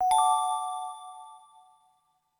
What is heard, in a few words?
bling; netent